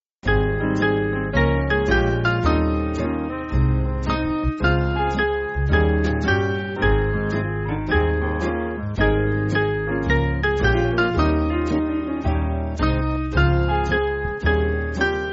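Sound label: Band, Music Backing; Ding Dongs Merrily; Branle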